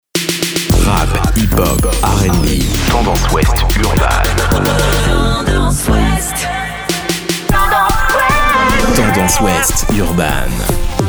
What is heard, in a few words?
REMIX